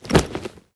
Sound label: UI Item Bag